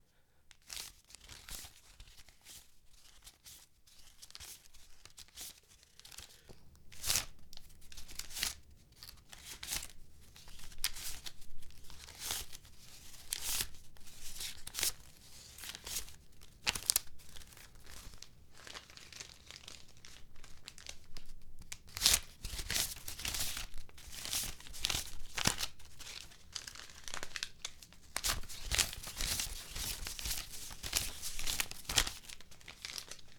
Counting Money

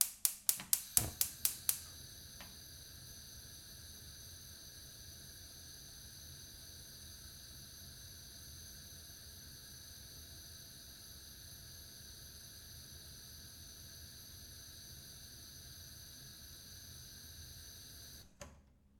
Gas Cooker Ignition

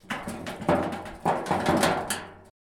Shaking Small Metal Door

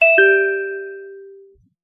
Notification Chime

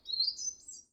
Bird Tweet

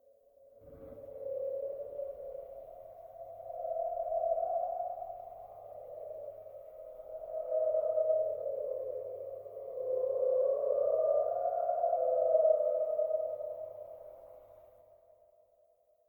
Ghost

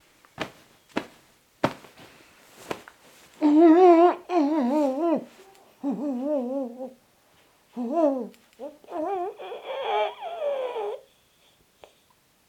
Muffling An Old Man With A Pillow

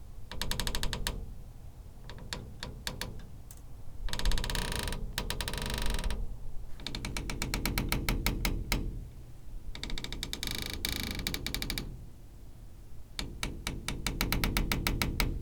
Squeaky Door

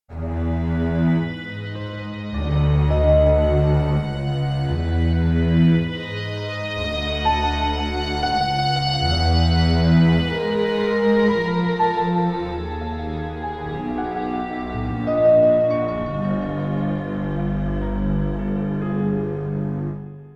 Horror Film Theme

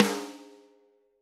Pearl snakeskin snare 14x8 rimshot; Vinnie Paul

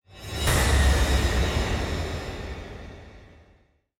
Scary Hits Risers

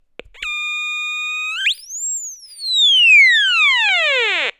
Cracklebox Scream Then Sweep Down

